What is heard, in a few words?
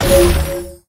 click